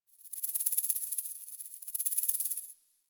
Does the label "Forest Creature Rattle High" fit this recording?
Yes